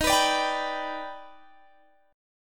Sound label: D sharp Augmented Major 7th D sharp, Guitar